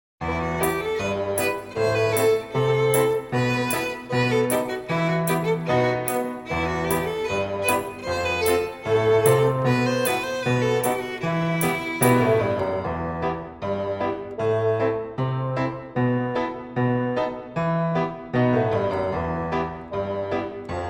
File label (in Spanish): Polca (Karaoke), flauta